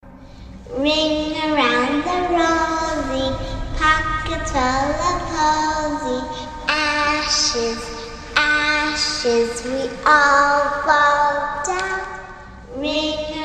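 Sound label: Is Calling R&B, ringtones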